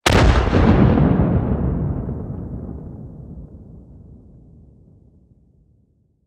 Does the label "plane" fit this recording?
Yes